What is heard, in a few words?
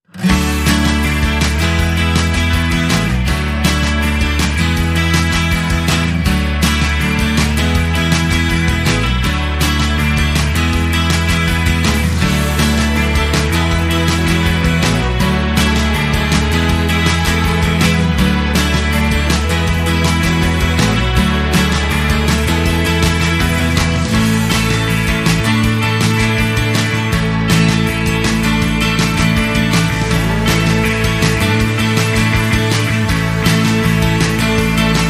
Lower Drum